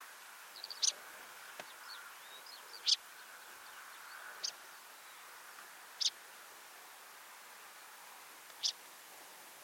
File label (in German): schwarzstirnwuerger